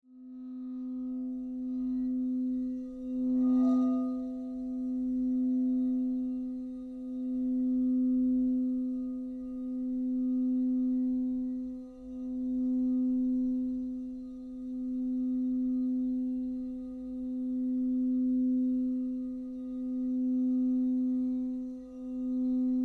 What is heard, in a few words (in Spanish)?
BOWL DO; sonidos de cuarzo; escucha y armoniza los chakras